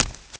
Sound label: run; The Elder Scrolls III Morrowind GOTY; XBOX; FOOT